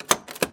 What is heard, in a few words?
lever